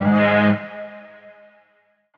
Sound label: vocal elevate Ab; SD AURORA Sample Pack; Slate